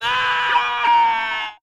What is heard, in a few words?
scream